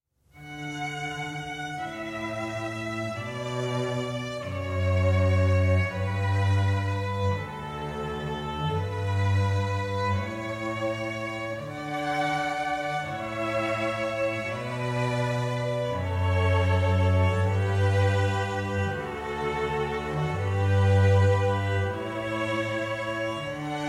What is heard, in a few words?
Canon Violins